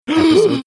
shocked gasp

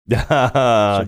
chuckling

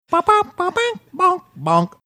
singing babow babank bow bonk

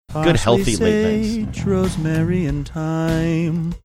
singing

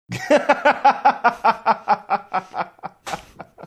laughing

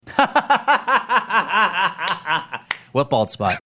laughing saying what bald spot

